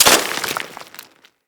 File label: Melee Impact Dirt